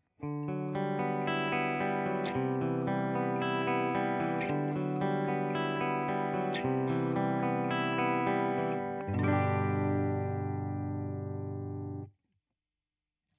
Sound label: 6L6 CLEAN; Boss Nextone